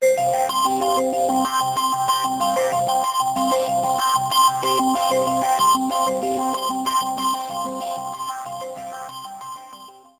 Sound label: perseus ringtone; samsung galaxy s2